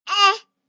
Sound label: Baby Sigh, Baby sound, 1 yr., sigh, complaint, human voice, kid, child, infant; Talking; VoiceBox